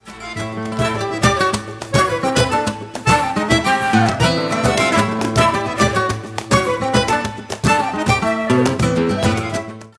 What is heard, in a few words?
rumba